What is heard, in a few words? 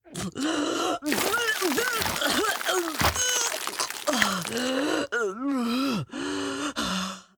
Vomit Egg; The Inn; Zara